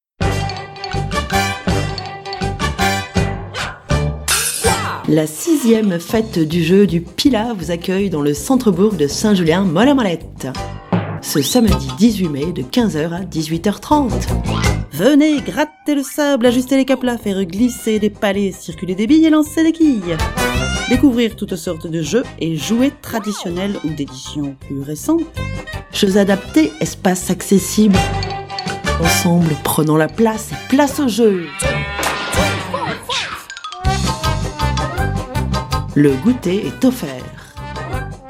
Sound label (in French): jingle